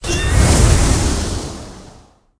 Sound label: lobby victory wind gust; smite; lancelot